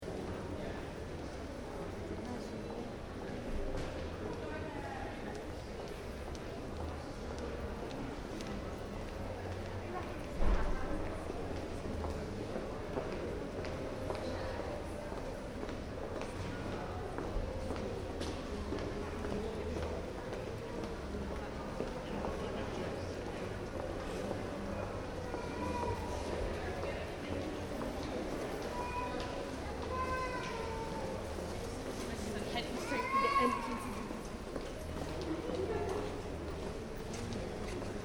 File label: Shopping Mall, Interior: Ambience, Small, Uk; Stores And Mall; Backgrounds; Stuart Duffield